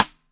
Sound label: RIM